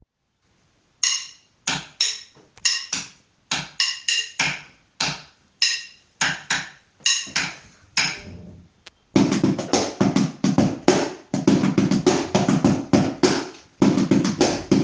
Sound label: Clap Boom